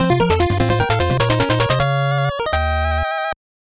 Period Clear; Robo Warrior; NES

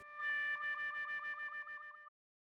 Death; lucky luke; psx

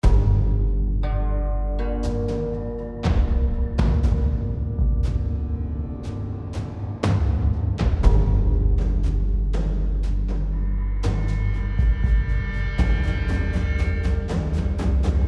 Tension; AB Tension 3 High Chunk 2

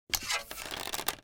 crossbow reload; Out of Reach: Treasure Royale

